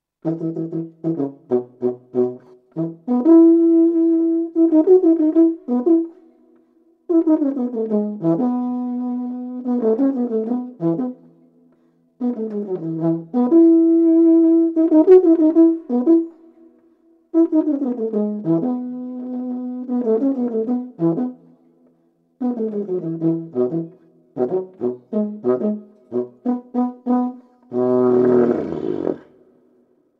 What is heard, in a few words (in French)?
Tuba